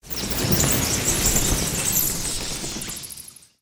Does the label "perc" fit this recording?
No